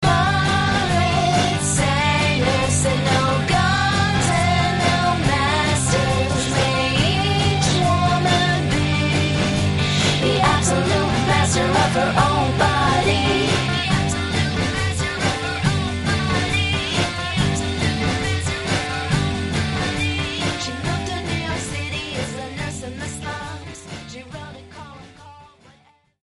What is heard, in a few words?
triplets; sanger